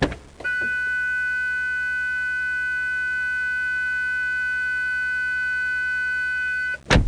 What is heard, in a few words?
warn; WAV Sound Effects; transpo; cars